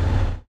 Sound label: stop, vehicles; bus